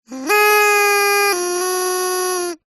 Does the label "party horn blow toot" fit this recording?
Yes